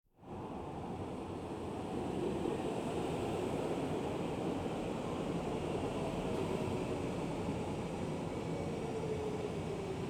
Metro arrive; KMP Film Video Sound Effects

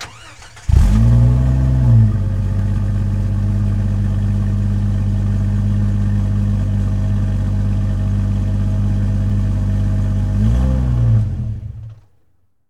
Jeep Wrangler Start Off; Trucks

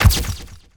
Pickup Special Film

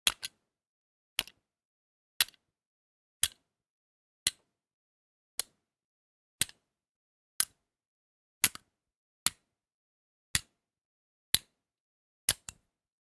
bayblade collide; devices accessories utilities; NS Studios - ultra sound collection

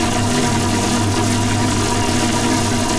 Sci-fi Electricity Hum Loop; SFX Kit; Sci-Fi Loops

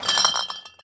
Glass Lift Beer Case; Foley - Rattles

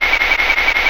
Satellite Burst Loop, Sci Fi; 1001 Sound Effects

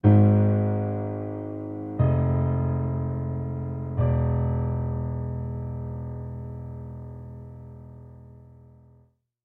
Game Over Fm Ab F E; DeadFright

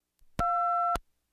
Household Phones

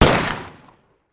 Rifle; Sound Ideas; Guns

